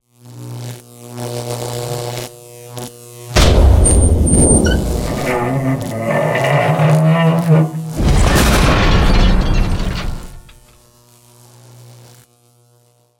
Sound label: Power Fist, Sound Effects; Warhammer 40000; Tabletop; munitorum Volume I Sound Effects